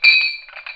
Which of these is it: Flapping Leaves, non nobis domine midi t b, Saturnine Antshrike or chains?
chains